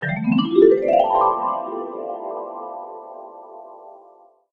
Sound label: win; burger time